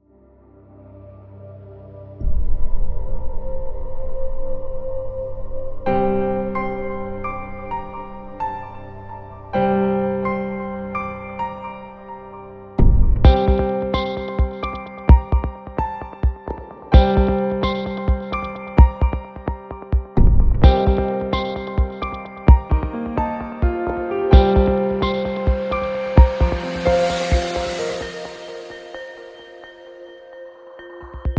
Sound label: Corporate Music